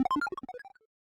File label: Boss Core Hurt Stinger; Avie Boss